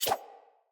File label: Dagger Whoosh; Sinecure Dagger